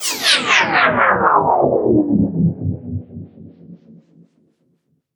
Downsweeps

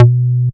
Synth Cuts; Vengeance Samples; VES1 Instruments

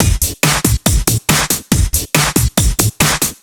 Breakbeat 140 BPM

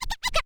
Cuts, Vinyl Record Samples

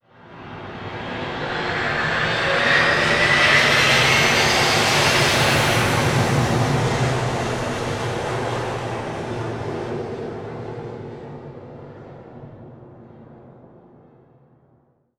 Uplifter

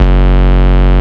Root F#, Bass; Vengeance Samples

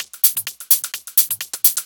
Loop, 128 BPM; Vocal-Hook Kits; Freaky Boys

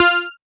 Synth Sound; Vengeance Samples; VEE Synth Shots

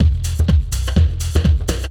drm 125, drumloops bassworx; house; PS-28 Vocal House Samples

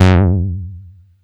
Bass Juno F#; Vengeance Samples

